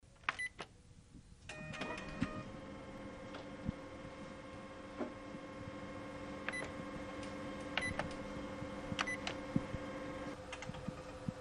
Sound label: Copier